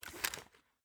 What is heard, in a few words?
weapon holster; weapons